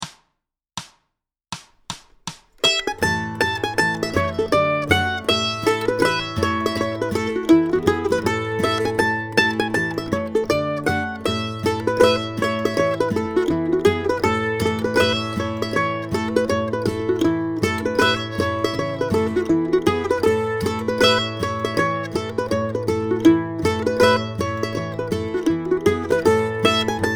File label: mandolin Cripple Creek; Jack Tuttle’s Bluegrass Mandolin Primer; standard fiddle tune, A